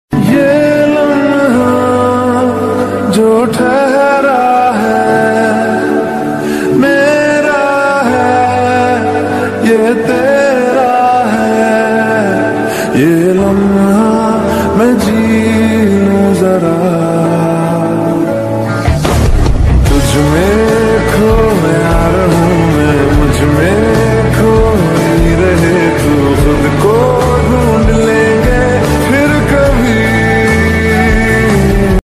best romantic ringtones hindi ringtone best love ringtone